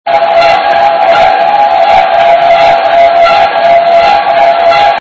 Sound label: squeaky ship